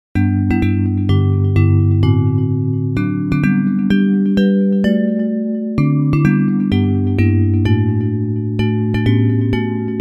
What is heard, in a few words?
Theres a Song in the Air Bells